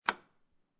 Light Switch Flick On, Household, Light Switch, Standard Wall Light Switch, Flick On